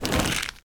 Candy Equip

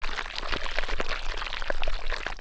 Exit Ground

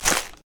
Lunge Hit

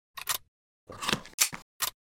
USP Reload

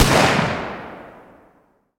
Rev Shoot

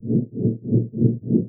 PASSIVE AMB STACK

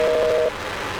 Signal Lost Loop

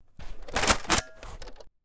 Shotgun Equip

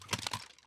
AK Equip

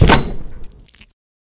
Lunge Launch

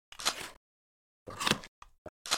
MP7 reload

